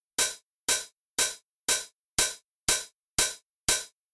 Rhythm

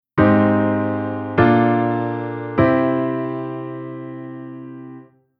Chord bVIImaj7

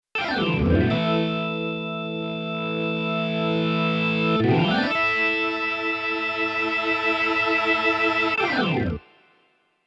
Keyboard Organ Rock Organ

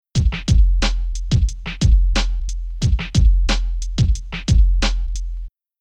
Rhythm HipHop

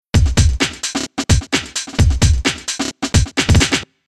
Rhythm Loop

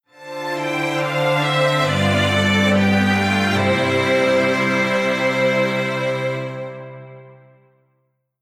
Strings

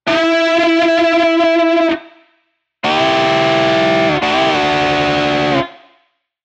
guitar other

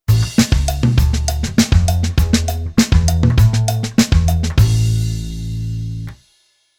Bass Arr Latin

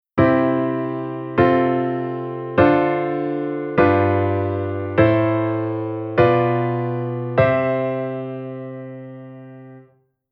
Chord Modal

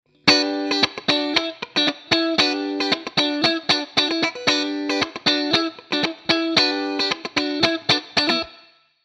guitar sound

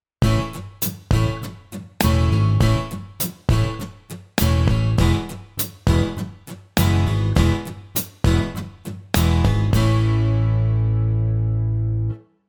guitar

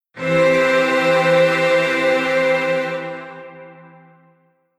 Strings Wasei Oct

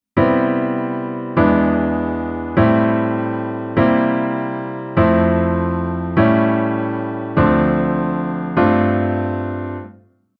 Chord Reharmo

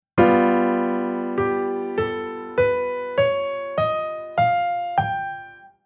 Chord Scale Dominant

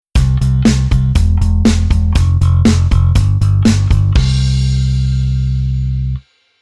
Bass Arr Rhythm Renda

